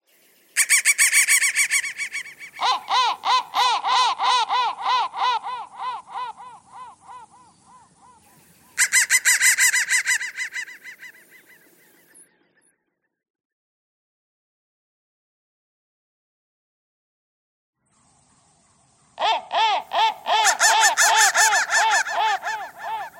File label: crow thread noise